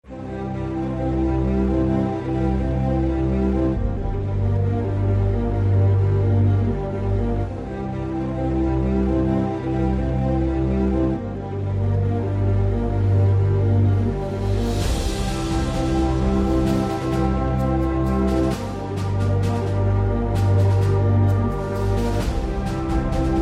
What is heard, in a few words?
Epic Journey, epic music; epic music vol 1